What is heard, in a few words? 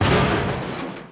Wall Hit; Barbarian